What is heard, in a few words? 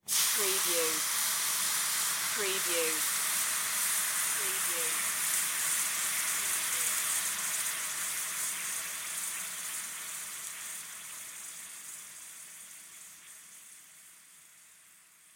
Sizzle Frying Pan; sound effects bible